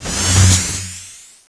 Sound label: exclamation; Diablo; Desktop Themes